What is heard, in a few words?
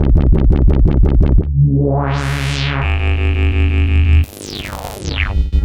Smart Bass, Drum & Bass; Fluid Dynamics